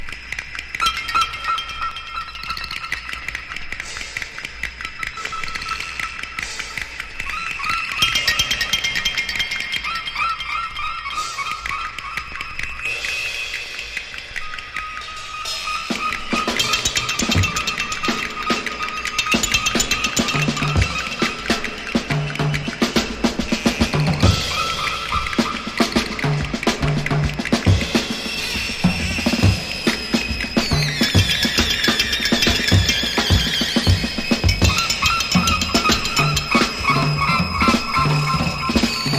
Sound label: psychedelic percussion